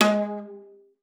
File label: G#3, VIOLA PIZZ; Xsample VOL-5; 90 s Sample CDs